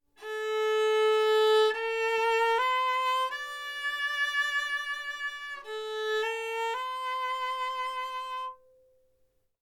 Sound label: Viola 1st Position